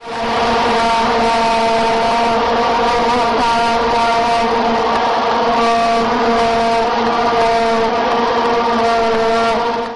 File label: vuvuzela